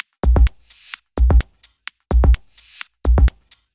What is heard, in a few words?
Loop, 128 BPM